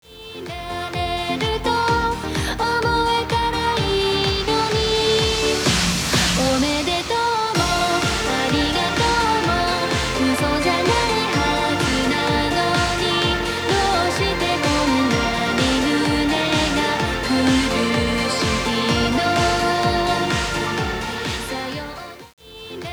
karaoke bgm; yakuza ryu ga gotoku kiwami 2 pc gamerip

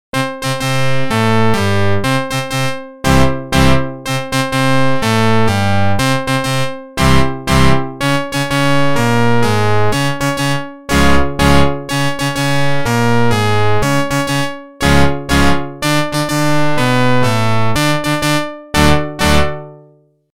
Organ; NHL 94

